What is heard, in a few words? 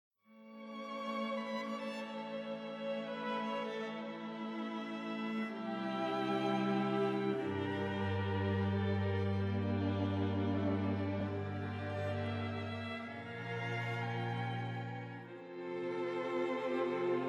Duett Concertino for Clarinet, Bassoon and Orchestra - Mvt. 1. Allegro moderato